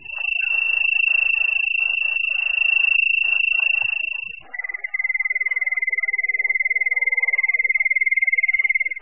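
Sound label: cricket single long ringing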